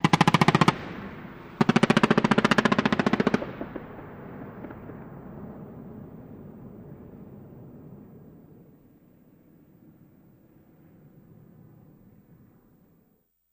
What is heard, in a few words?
Machine Gun Firing R, modern